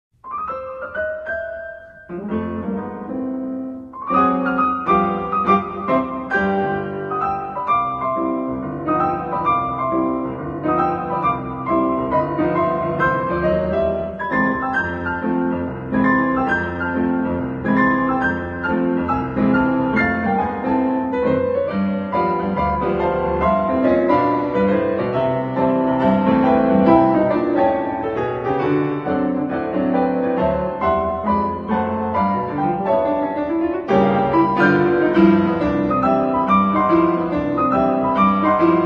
piano

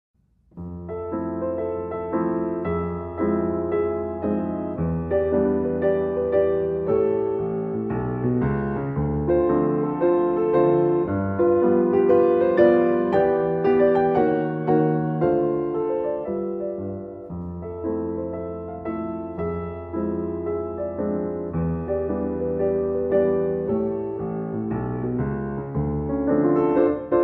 Jazz Finale

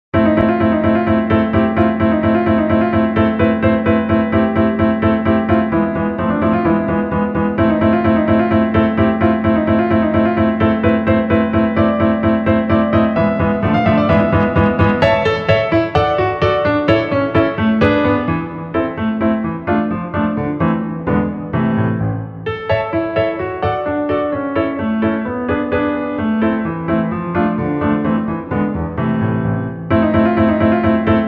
toccata vivo

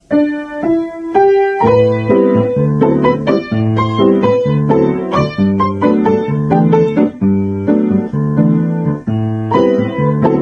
Bossa Nova

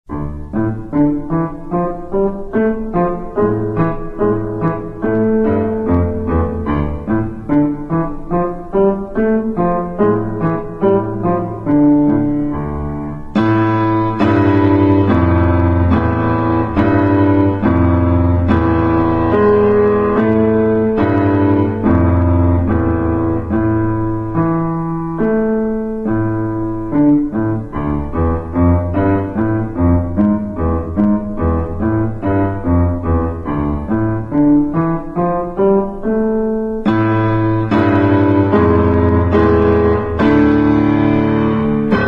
the squeaky stairs

